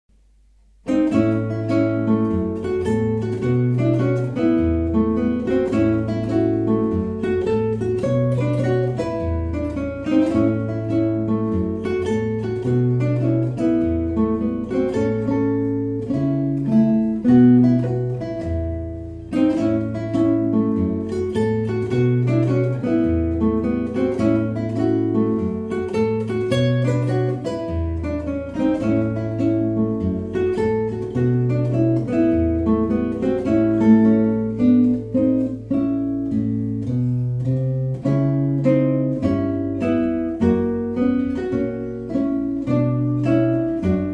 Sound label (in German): Jazz gehts los Duo